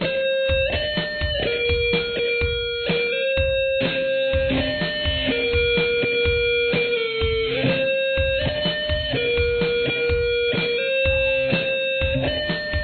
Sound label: intro solo